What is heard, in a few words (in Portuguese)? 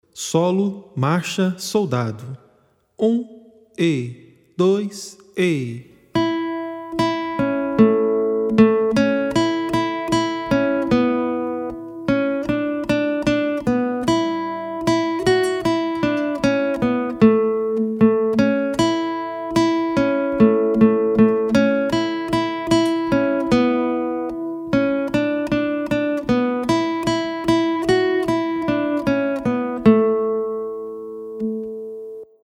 Marcha Soldado Solo, violão